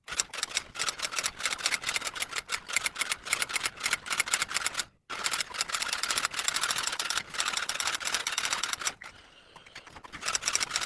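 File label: typewriter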